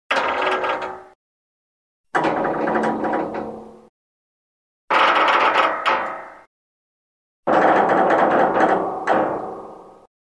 Metal Spring Strains Reverberant Spring Strain, Mach; Hollywood Edge Foley Sound Library